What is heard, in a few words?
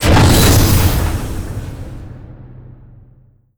stop, waste pit bridge